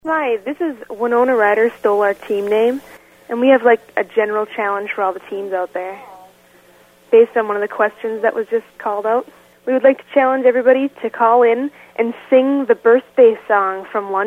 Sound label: Sing Birthday Song